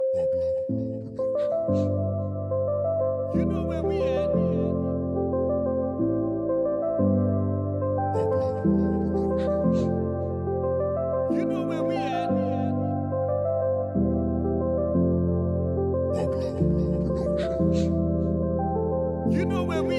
POP EDM

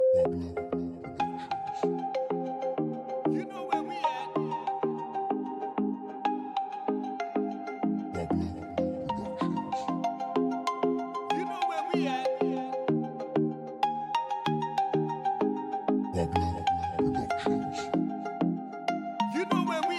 Latin Reggaeton

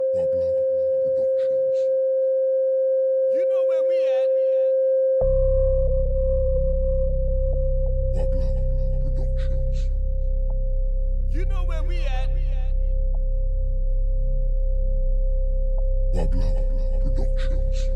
Reggae Dancehall

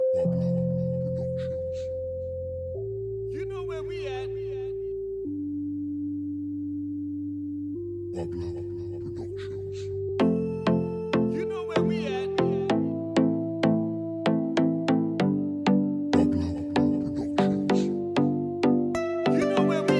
Latin Reggaeton

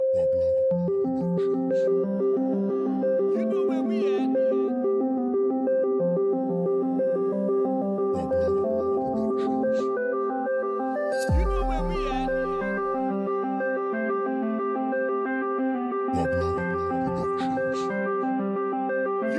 POP EDM

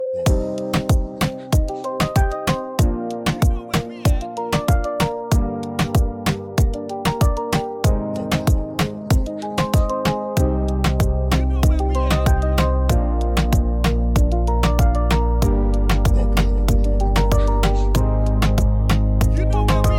Latin Reggaeton

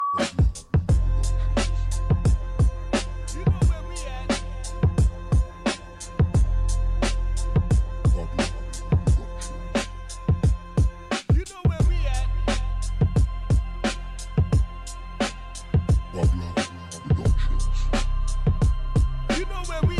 Concrete Gospel

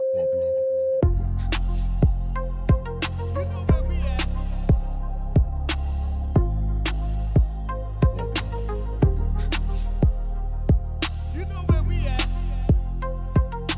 Reggae Dancehall

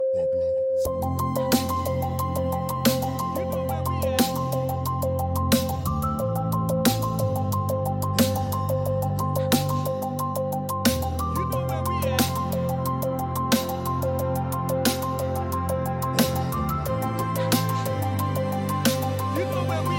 POP EDM

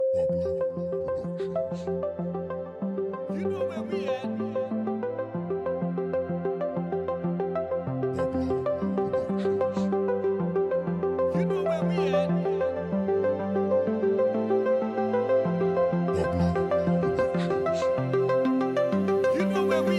POP EDM

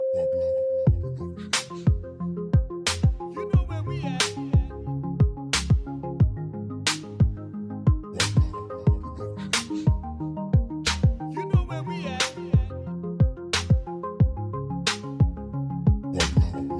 Reggae Dancehall

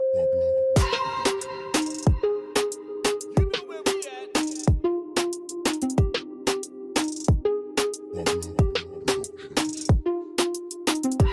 Reggae Dancehall

